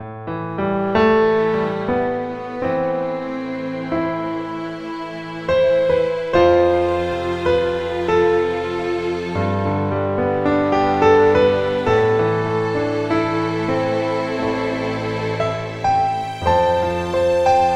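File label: Piano & Strings, synth; KORG OASYS; oasys assault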